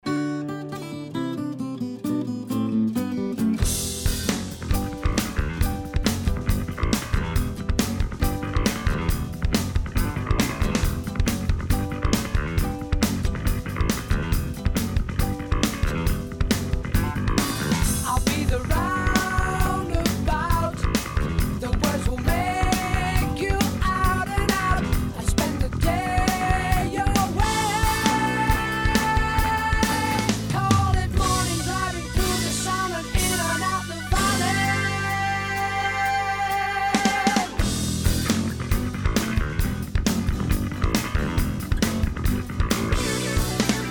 splat round; surReal

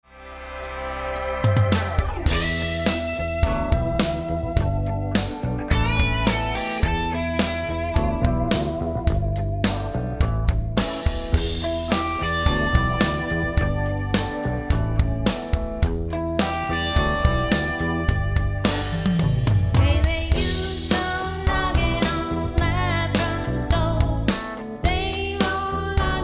clean bright; surReal

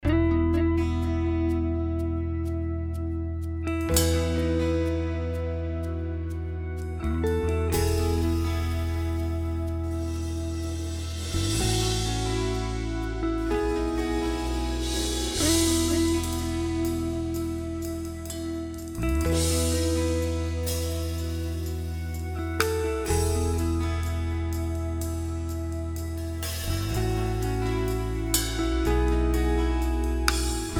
smooth raw; surReal

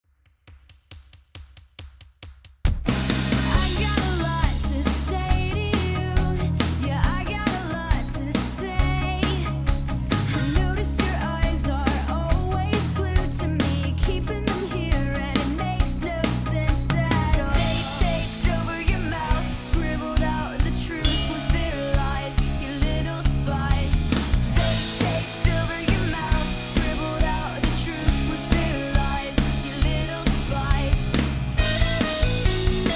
fidelity prog; surReal

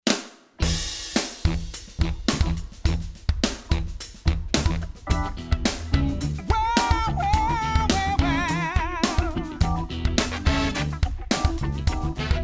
studio tight

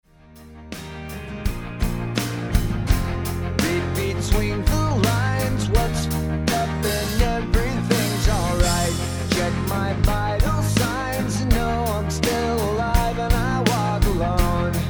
fidelity rock; surReal

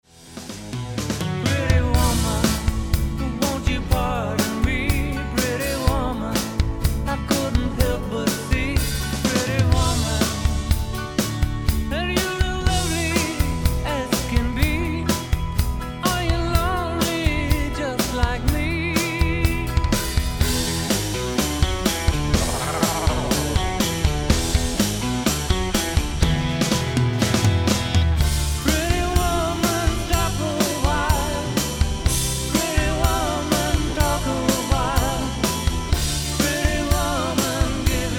americana; surReal

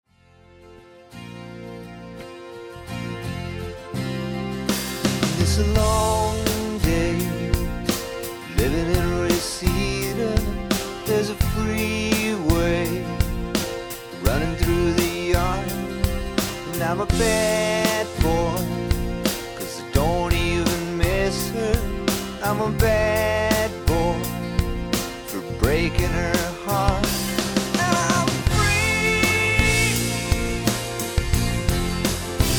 country kit; surReal

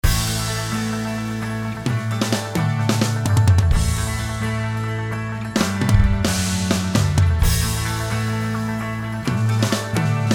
workshop; surReal

